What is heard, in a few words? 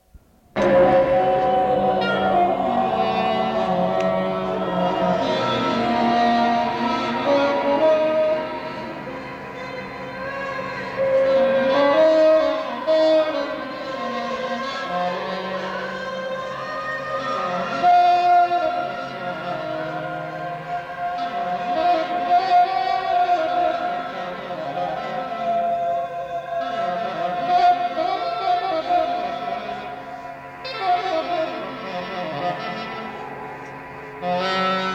sax and tape